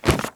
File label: book down